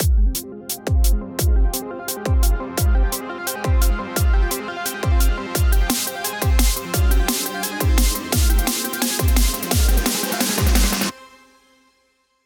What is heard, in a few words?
Intro Dnb; Cyber Hook